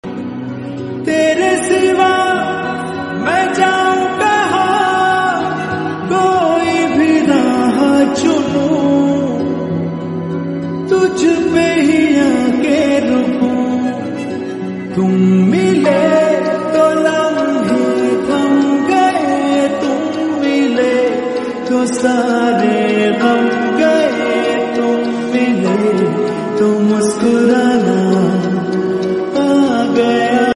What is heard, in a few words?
hindi romantic song